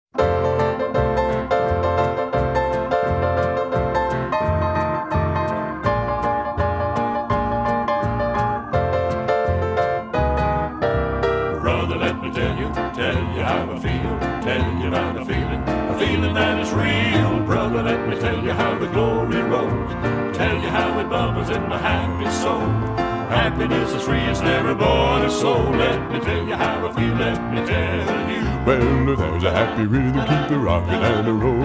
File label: Happy Rhythm, gospel music